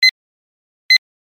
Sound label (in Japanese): pi